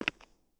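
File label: Foot Concrete Sneaker Walk, footsteps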